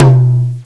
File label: new tom; Hexen Demo; Hexen Music; Hexen 6vox